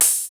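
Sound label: HH, hi-hat; 90 s Sample CDs; Sound & Vision - Gigapack I CD 1 (Roland); Dance-Kit 2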